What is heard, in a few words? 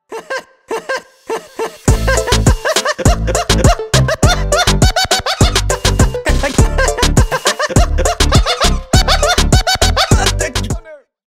remix smeh